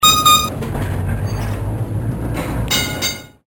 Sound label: trolley